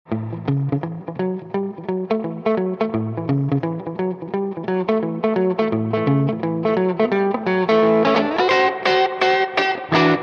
Les Paul Rockabilly

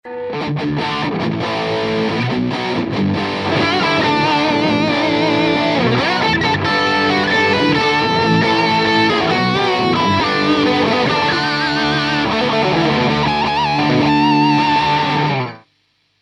Les Paul, treble boost

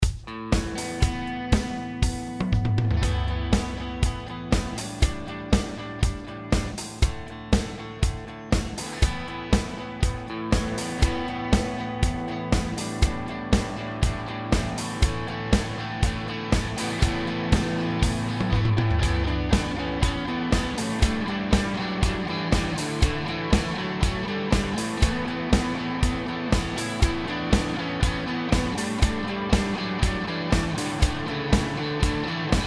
Tele Stereo Out; LA V6; Ausgang

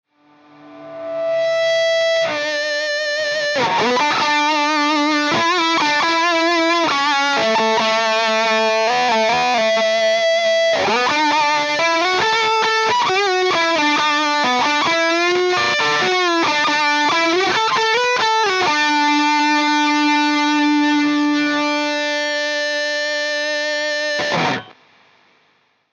Tweed Tele Range Treb boost

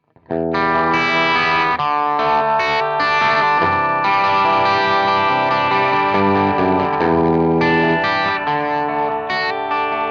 Les Paul sparkle crunch

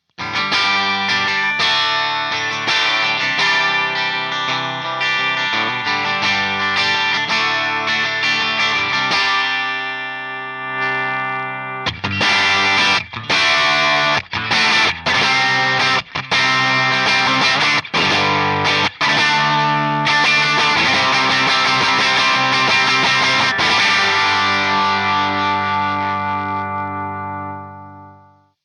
JTM45 Tele crunch rhythm

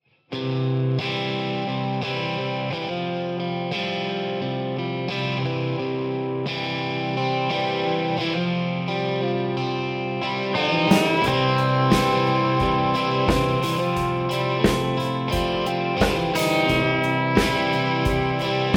Fulldriven strat

